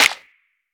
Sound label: long tail reverb clap sound c sharp key